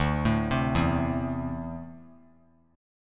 D 7th Suspended 2nd down, chord

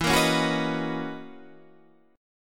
F sharp 13th E

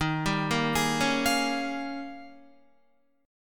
Eb 11th Eb, down, Guitar, 4F,4A,5C,5G,5C,6E; Eb11 arpeggiated

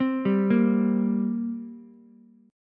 G Minor Double Flat 5th, Ukulele; arpeggiated; 5,7,6,x

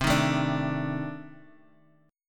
C Minor 6th Add 9th C; Cm6add9 strummed; JGuitar

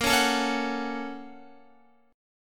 A sharp Minor Major 7th Sharp 5th, down, Guitar; A#mM7#5 strummed; JGuitar

